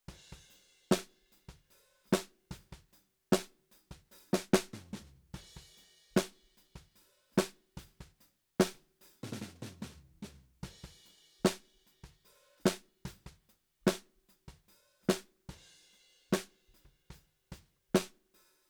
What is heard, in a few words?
Snare Bot